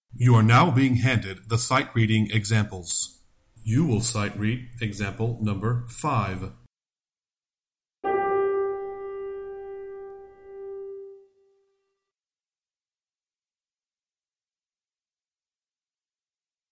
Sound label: Sightreading Example Alto